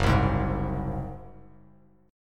F sharp Suspended 2nd Flat 5th F sharp, Bass Standard; strummed; JGuitar